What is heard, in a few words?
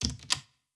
Door Open; blue archive